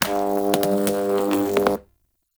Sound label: Teralyst Electric Step; Enemies; Steps; Warframe; Eidolon